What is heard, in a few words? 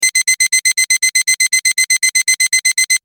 alarm clock